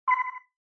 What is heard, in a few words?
OS Sound (Speaker-Notification)